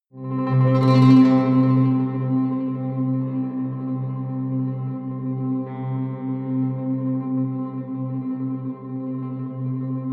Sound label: rattling piano drone, drones